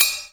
BELL JPN, JAPANESE PERCUSSION; AKAI S6000 CD-ROM - Volume 5; Ethic

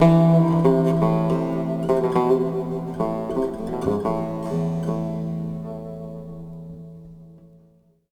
SIERRA GUI

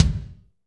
kick, Power Ballad; Northstar; Drumscapes Roland

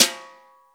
BRUSHES

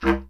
B. Clarinet

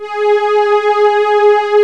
STR F3, FUNK

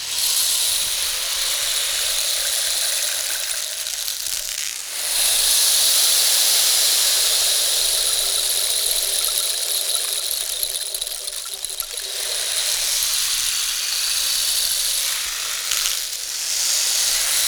Rain Stick; Sonic Foundry (Sony Creative Software); Continental Drift Aftershocks

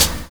LAUGH; Univers Sons

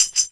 SHAKERS; Best Service; Dance Mega Drums CD2

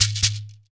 shaker, Afro Shakers, Shekeres; Roland LCDP14 Africa VOL-2

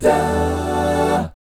EMI7 AA, GUY CHORDS; Spectrasonics; Vocal Planet; Jazz FX

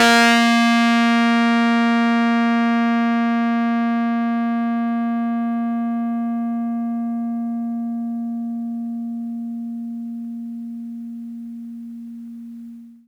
Rhodes fuzz; EP FX; PlatinumPhattCD2; E-MU Producer Series Vol. 8 – Platinum Phatt

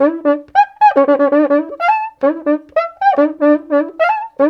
RIO, Rio Batucada

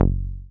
SYN 303, TB-303; TB303+MC202; Sound & Vision - Gigapack I CD 2

